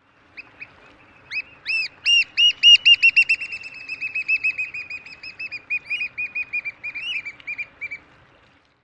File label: display song